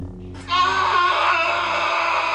lightsaber; goraptor

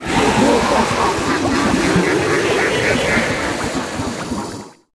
poison spell

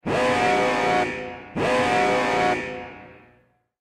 attack; starcraft 2

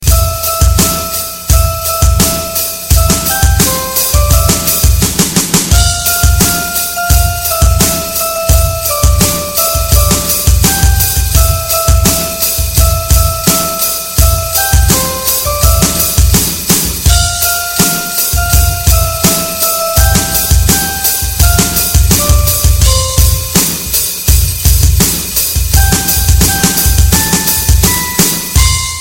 jingle bells modern

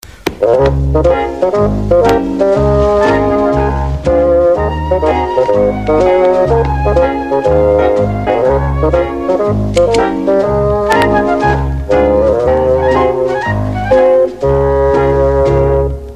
hungarian tv bear, ringtones children

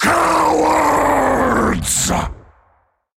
trumpet; christian

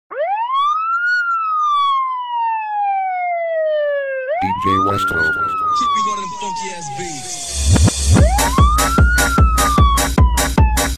New police Mix

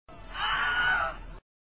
scream; wilhelm scream

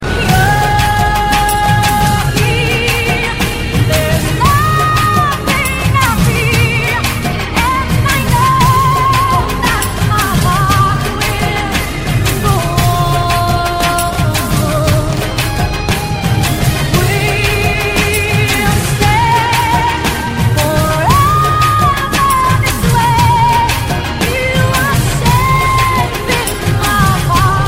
punjabi, ringtones

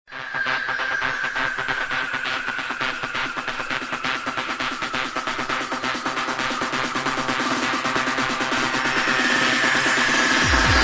Techno Remix

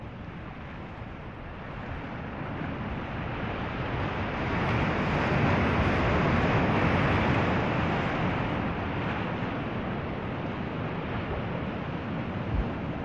Surf ocean

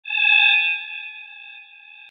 SMS phone

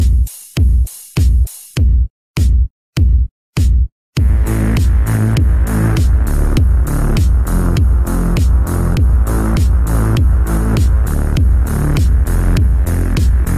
Strong Beat

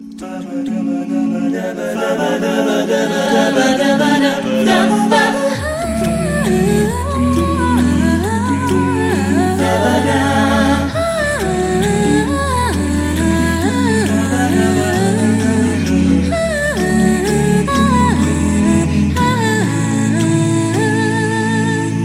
nokia acappella; alternative

